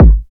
puffy kickdrum sample g sharp key, Royality free kickdrum sound tuned to the G# note